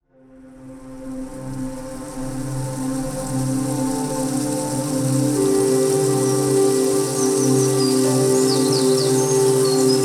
Relaxing Forest Brook

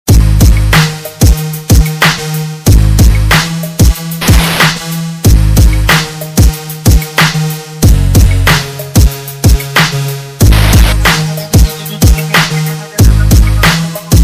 Bass Musik

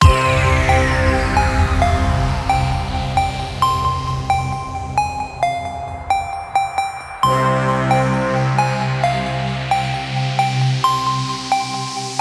Bass Boosted Car Bass

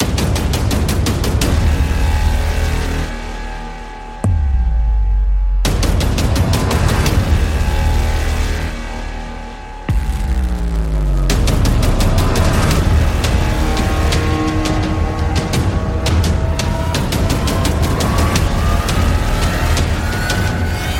Demolition Drums; Demolition Drums 2

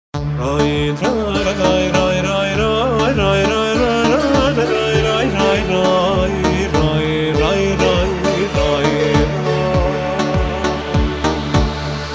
BASS

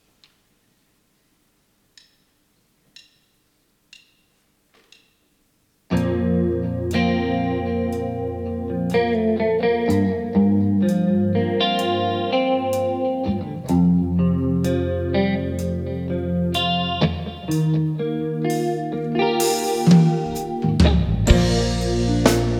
Rock Dance Ensemble

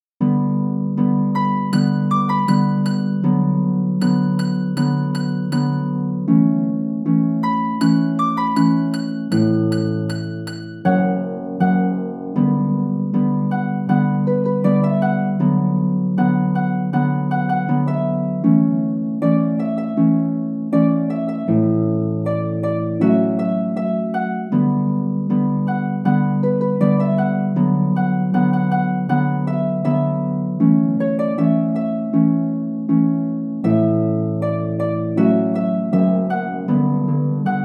Instrumental

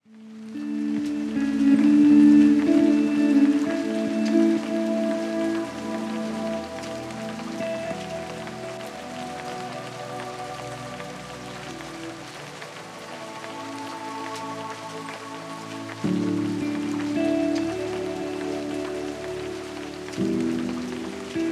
Sleepy Rain Sounds

